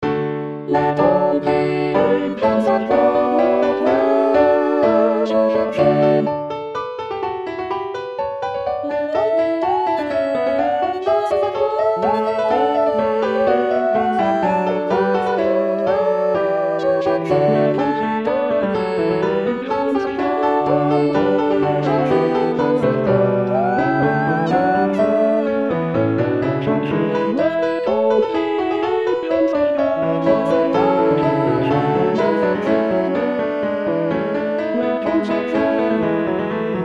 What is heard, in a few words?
Soprano; Messiah